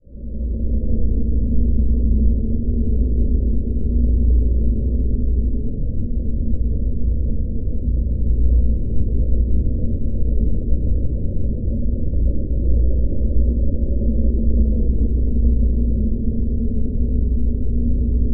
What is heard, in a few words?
Creature Lair, aliens